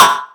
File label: Metal beep; SFX; One shot; 7rystan's 600+ FREE EDM SAMPLES & DRUMS